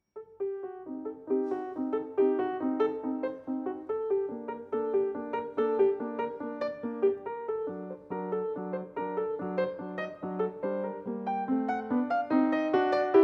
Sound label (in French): fantaisie en re mineur K397; Wolfgang Amadeus Mozart